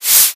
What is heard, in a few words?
page